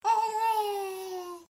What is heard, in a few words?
Baby Sounds